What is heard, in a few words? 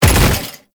Body Fall Light Default; Enemies; Warframe; Tau Dax